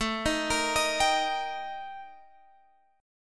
Eb Major A; arpeggiated; JGuitar

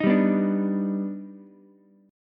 Eb Augmented Major 7th Eb down Ukulele; Eb+M7 strummed; JGuitar

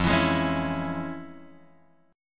F Minor Major 7th Sharp 5th; FmM7#5 Chord {1 X 2 1 2 0} strummed

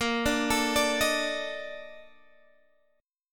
Bb Major 11th Bb down Guitar Standard; arpeggiated; JGuitar

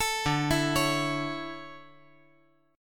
D Major 7th Suspended 2nd

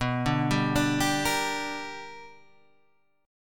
B Minor Major 7th Sharp 5th, chord

